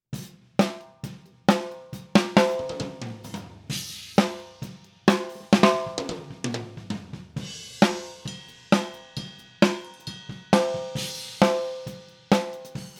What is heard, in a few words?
snare audix I5